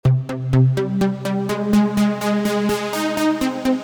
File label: Arp Melodic Techno Spire